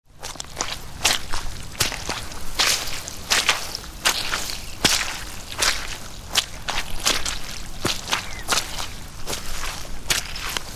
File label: Walking Through Bog, Footsteps walking on marshland with water bird ambience.; Human